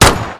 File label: SIG P229; weapon